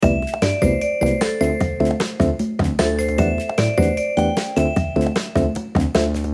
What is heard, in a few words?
Short mistery